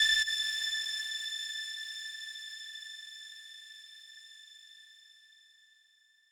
lead3

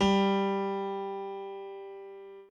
piano chord

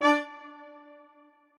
strings

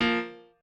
piano

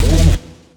Key bass; Luxembourg Zwee

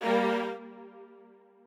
strings

